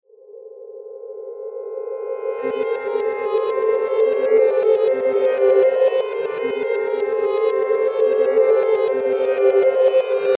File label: Sweeper Reverse Metallic Suspense Abrupt; Production Element Imaging Element Accent Transition; Sweeper Sound Effects